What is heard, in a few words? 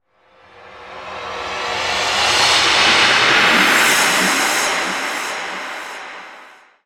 TECHNO FX 140